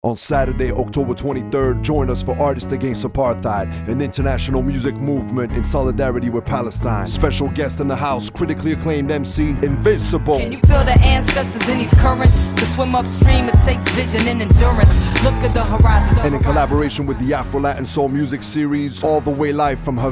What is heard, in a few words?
afro latin soul